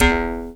E JAW, Primitive Instruments

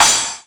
percussion one shot crash high smash; blue; Sample Magic; Classic Chicago House; Drum Hits

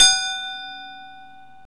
pipe, metal & wood; Roland - LA Composer vol. 1

